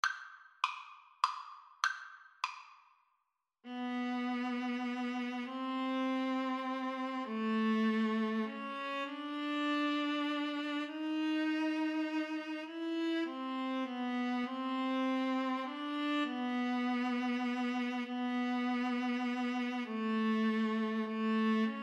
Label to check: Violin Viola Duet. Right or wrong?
Right